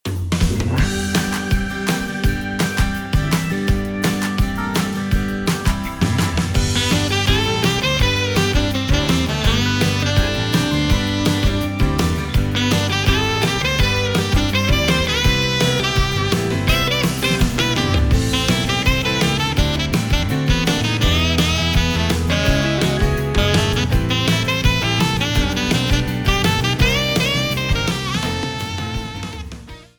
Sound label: Harbor Rock Arrangement; Toad Harbor